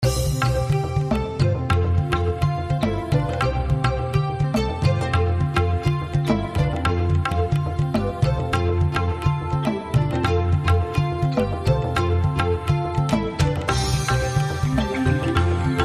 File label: Tabla Dance